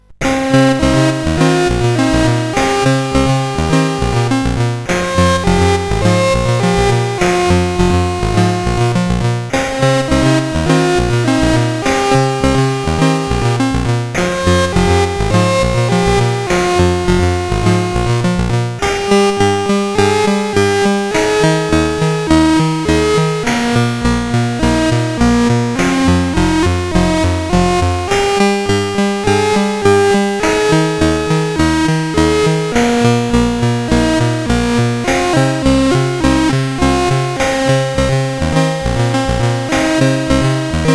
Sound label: sid, CSG8580R5; Sledged